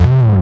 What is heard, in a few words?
Single Acoustic Basses